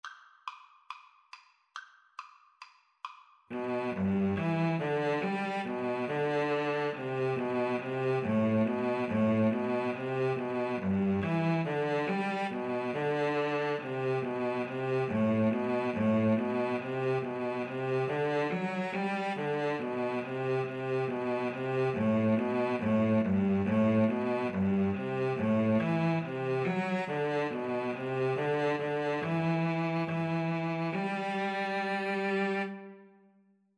vla duet, Viola Cello Duet

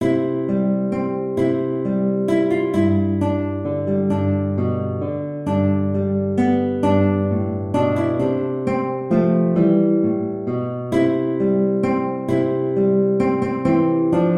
GTR

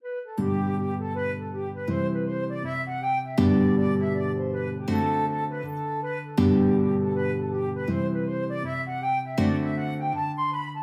flute guitar duet

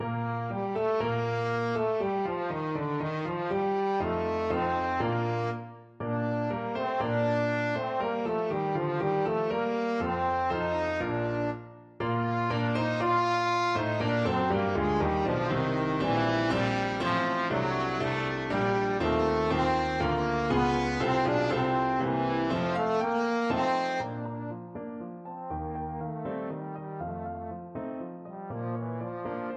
trombone

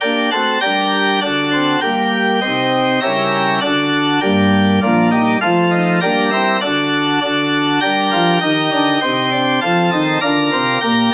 organ

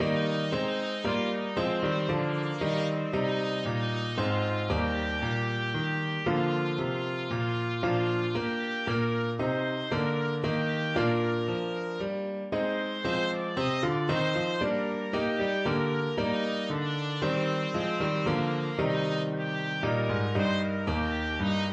be thou my vision, trumpet

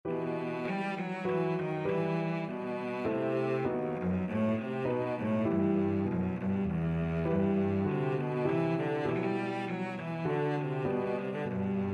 minuet in d minor, cello

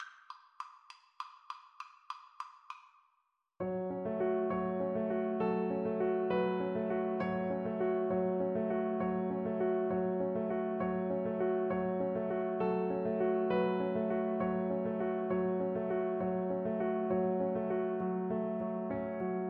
cello

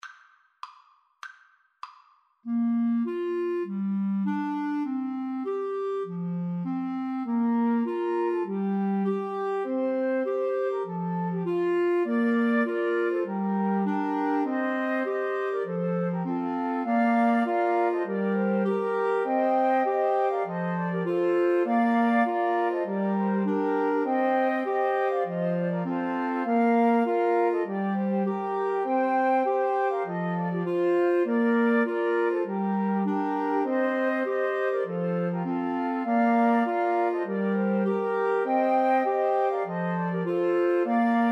i love the mountains Flute Clarinet duet

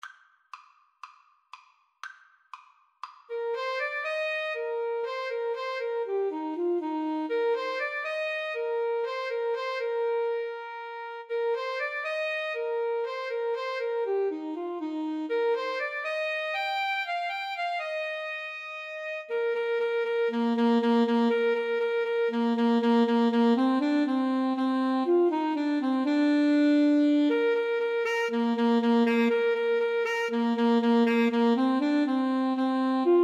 baltimore belle 2 A SAX

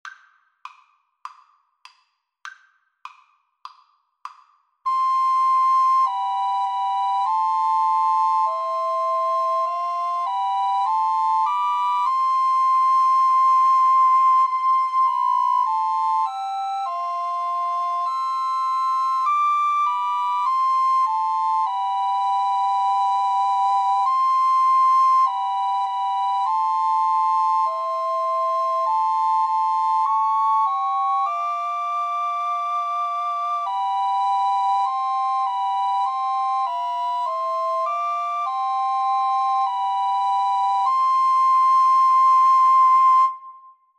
abide with me, Recorder Trio

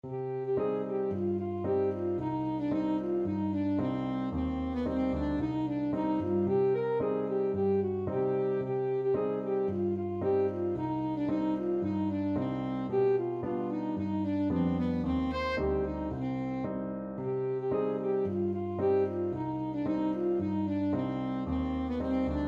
syrtos, saxophone; simiotises

